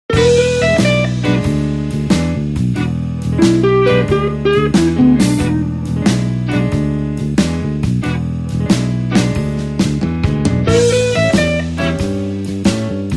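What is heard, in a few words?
blues shuffle solo E call response with feel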